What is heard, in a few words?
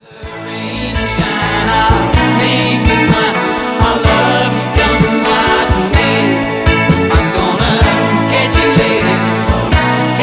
pops men